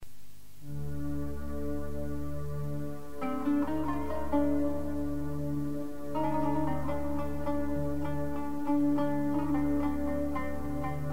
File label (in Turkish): Kanun Taksimi